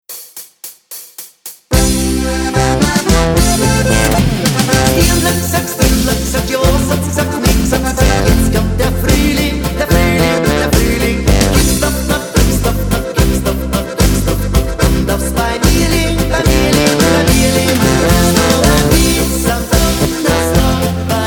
GCFB mit Chor

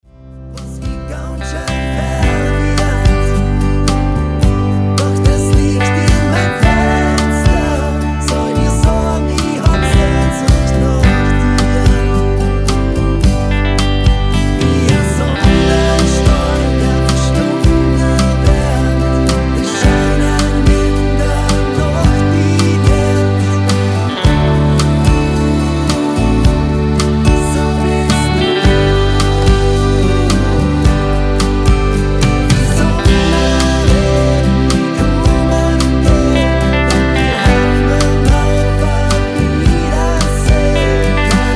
mit Chor

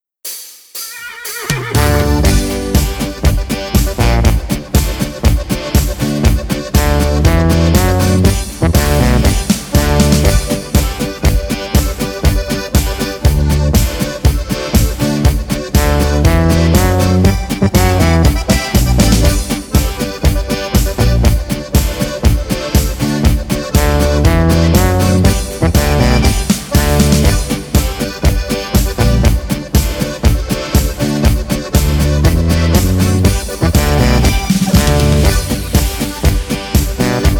ohne Chor

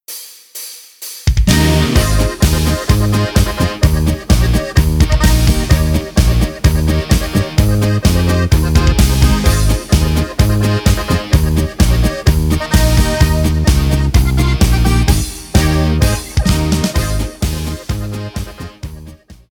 Hochzeitsmarsch B Es As Des; Zillertaler

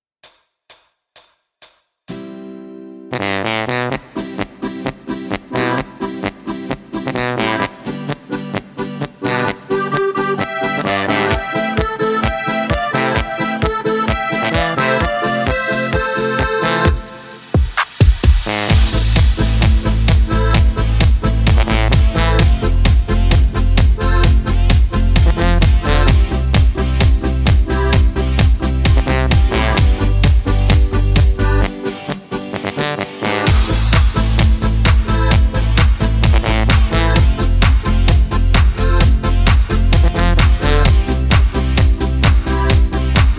C Dur Karaoke ohne Chor

